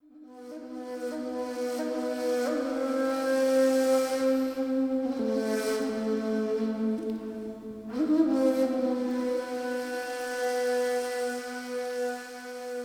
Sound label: Orient Flute; rise of venice windows gamerip 2013